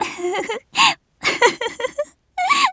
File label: laugh; Eternal Return; Tia; JPN